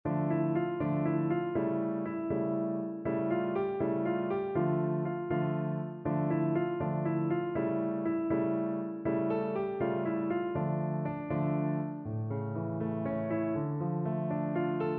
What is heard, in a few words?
tarantella